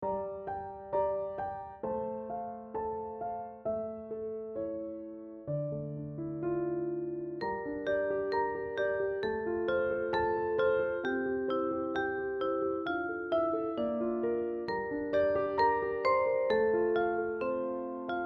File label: Piano Vocaline 8va; Les 2 Oefening 19